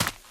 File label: dirt, footsteps run